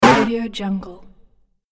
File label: platform hit